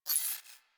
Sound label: UI Weapon Zoom In; Player Ship; Warframe; Railjack